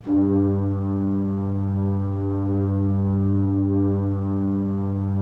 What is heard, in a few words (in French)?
Orgue Eglise Strings G3, Pipe Organ Strings